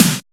SLAM THE GATE, TECHNO; Drums In Your FACE!

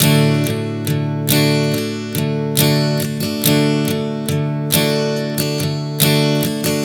Strum 070 D, One Chord Strumming 070 bpm

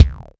BD, Bassdrums, Roland TD-20

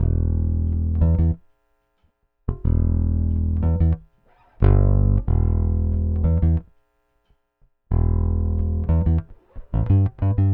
Rock, Bass; Bass X

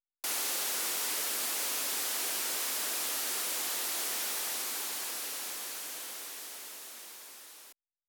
128BPM FX Noise Down; Vengeance; Essential Clubsounds Vol.5; Early Morning Kit; Root G

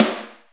Snare, Akai MPC-500; Toolkits; Snare TKit

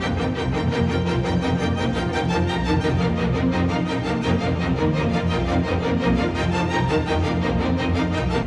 Strings; Sonic Foundry (Sony Creative Software); Orchestral VOL-2

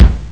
bas drum; skiordie; Amiga Soundtracker Sample Packs

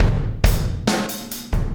Impact Crater Beat, Processed Drumkits

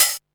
hat, Techno 138 BPM

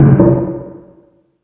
Industry, Drum Machines E-MU Pro-Cussion

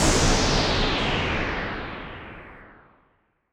Whoosh, sci-fi

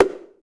Bongo, Roland BK-5; Percussion

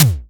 SDS5 snare lo, Simmons; Simmons sds5 kits

